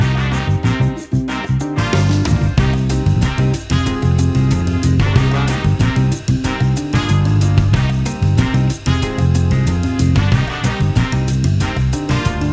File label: Acid Jazz Bossa Nova Fusion Music; 30 SEC